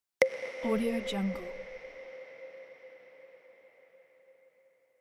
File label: bells sound